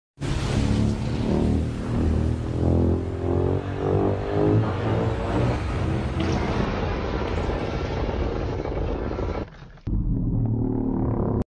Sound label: atomic breath; godzilla 2019